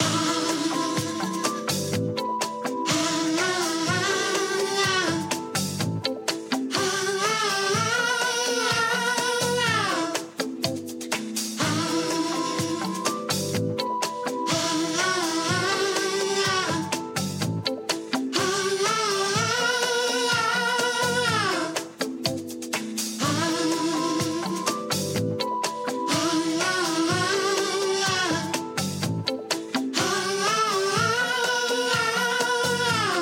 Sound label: COMMERCIAL JINGLE